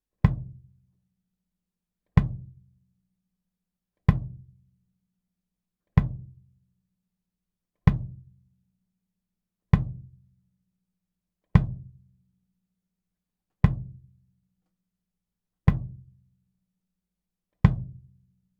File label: Kick